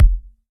Kick Drum Case; One Shots; Drums; Quark

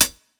Hihat Closed Shuffle

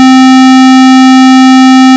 OB SQ, Synths; Multisamples; Ableton Live 12 Lite; Core Library

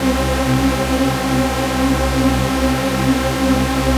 Synth Note; One Shots; Instrument

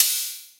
Hihat Open Analog

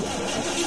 engine ignition; There; ThereClient

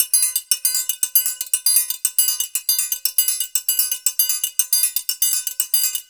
Triangle 118 bpm; Loops; Drums; Percussion; Ableton Live 12 Lite; Core Library

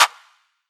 Clap Streets, Drums; One Shots; Ableton Live 12 Lite; Core Library

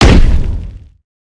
push pullable drop hard; action; impact

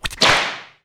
rope break; Gish demo

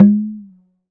Tabla; Drums; Misc Percussion

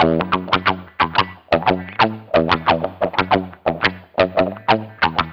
Beefy Chop F#, Guitar; Loops; Tonal; Ableton Live 12 Lite; Core Library

